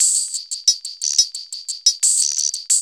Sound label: LOOP; E-Lab; X Static Goldmine 2